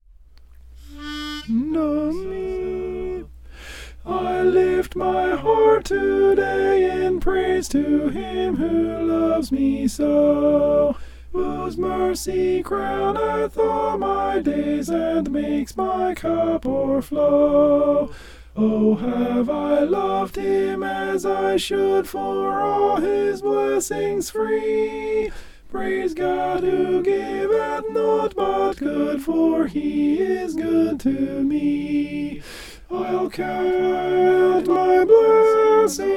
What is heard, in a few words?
alto